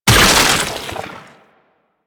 Fishing Fish Kill; Warframe; Infested Fishing Spear

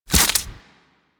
Arch Gun Primary Beam Impact Flesh; Warframe; Archwing; Hildryn

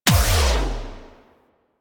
Melee Heavy Attack Lift Impact; Warframe; Weapons; Whooshes; Heavy Attacks

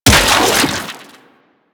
Rifle Charged Fire; Warframe; Infested; Quanta

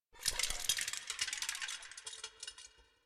Mech Entrance; Erra

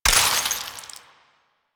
Ammo Pickup Viral; DE; Warframe; Warframes; Frumentarius; AmmoAbility

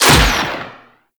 Missile Shot; Warframe; Weapons; Archwing; Powers

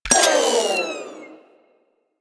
Rifle Low Clip Mech; Warframe; Weapons; Wisp Rifle

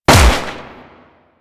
Lever Action Pistol Shot; Grineer

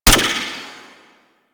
Mech; Warframe; Void Trader; Gorgon

